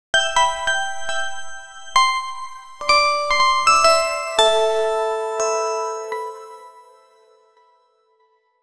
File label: soft bubbly synth; sparkle